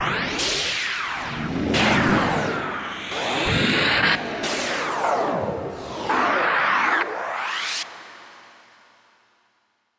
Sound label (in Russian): mashina vremeni slomalas